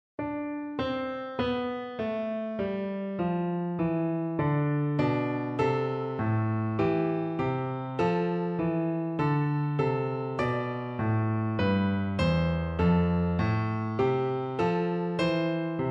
personet piano

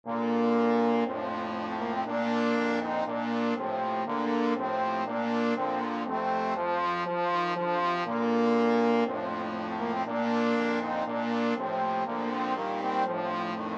trombone quartet

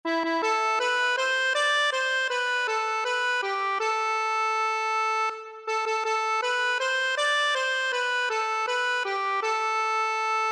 accordion

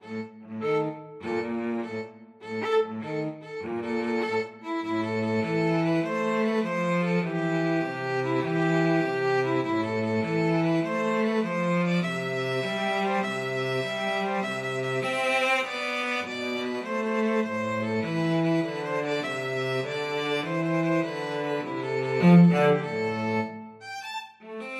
violin cello duet

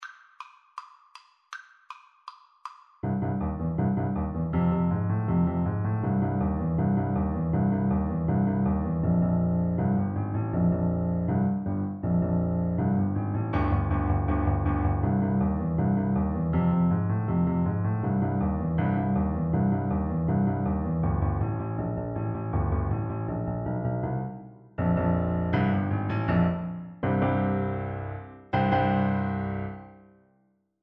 Trombone

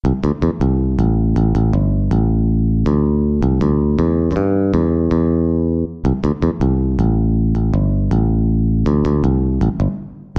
bass guitar

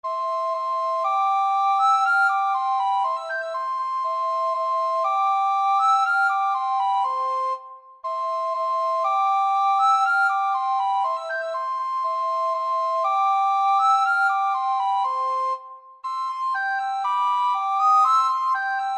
recorder duet

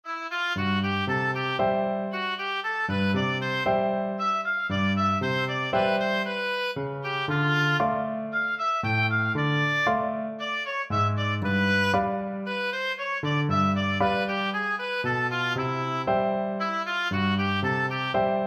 oboe

